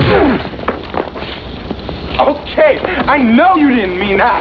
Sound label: punch; Once A Thief